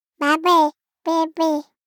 BABIES TALK Boy 12 months Babey Bebe; Baby Boom

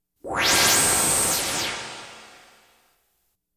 Science Fiction effects, Lasers, Light beams

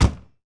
Ferrari Dino Door Close, Automobiles

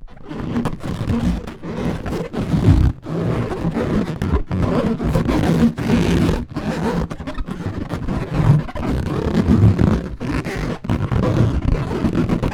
balloon rubbing; noisemakers; NS Studios - ultra sound collection

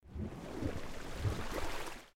Waters Port; Blind Quest - The Frost Demon

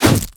Creature Melee Physical; Kilta

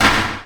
Menu Select; Menu FX

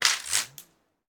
Bullet Pass By; Sound Ideas; Guns

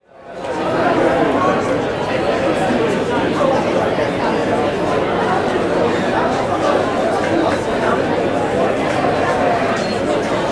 Crowd Indoor Busy Pub; Walla; Sound Ideas; Walla Series

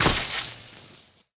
Lightning Enemy Footstep; AudioWizards

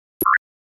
computer bleep; NCH Library

